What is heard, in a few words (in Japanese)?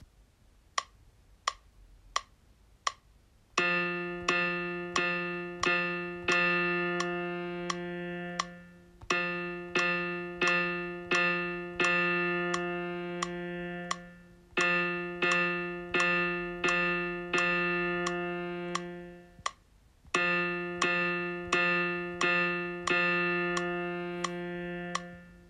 男性キー母音